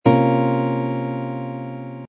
c dominant eleventh flat ninth chord voicing, guitar